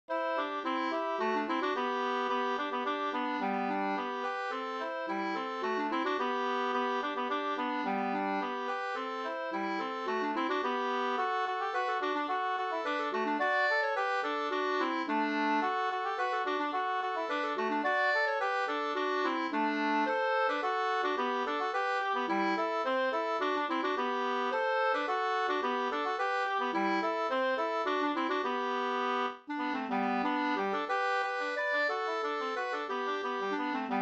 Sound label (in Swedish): folkdans, Kontra; Lollands 2st